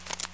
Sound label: Paper Turn; AIDS